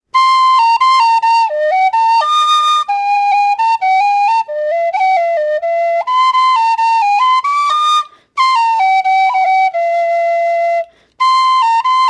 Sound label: Flute